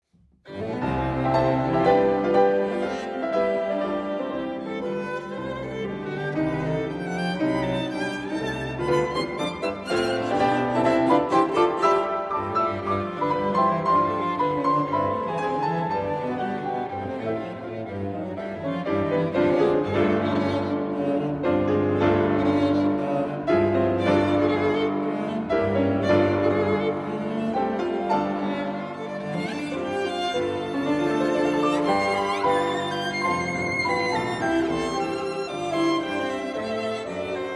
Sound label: Piano Trio, Finale. Allegro Risoluto